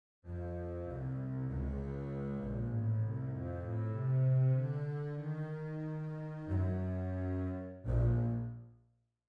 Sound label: double bass section; sibelius sounds